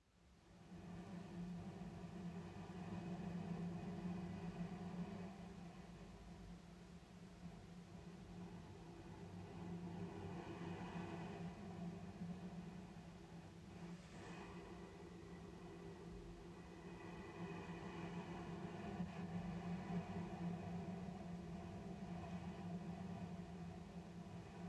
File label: Cello sound